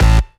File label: Synth Bass; Vengeance Samples; VEE Synth Shots